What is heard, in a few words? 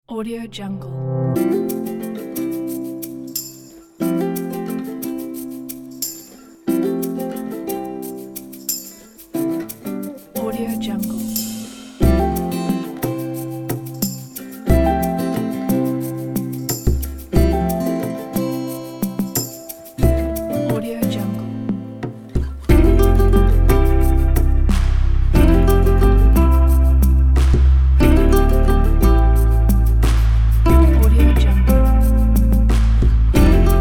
documentary acoustic folk